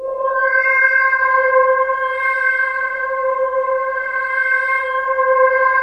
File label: wake up pad; WAKE UP LYR; Masterbits - Tekkno Trax; SYNTHFX+PADS